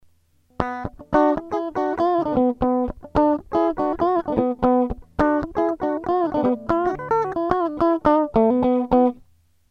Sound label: AutoWah Guitar off